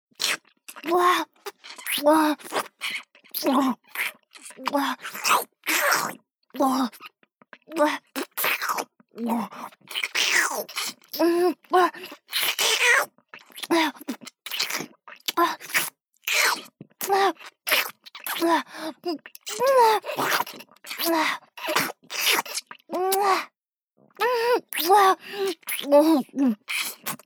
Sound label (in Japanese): ピストンフェラチオ（激しいVer）【ループ】